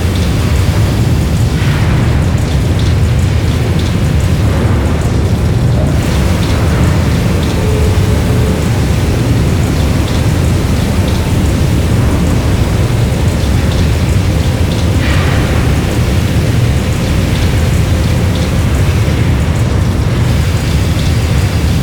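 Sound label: Generator Ambience Loop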